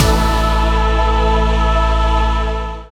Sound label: SYN DANCE; Sound & Vision - Gigapack II